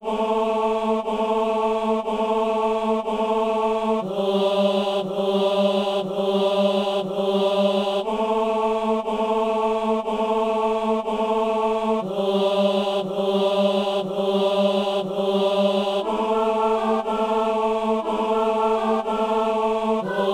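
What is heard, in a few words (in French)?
ténor; Messe de Saint-François-Xavier - Sanctus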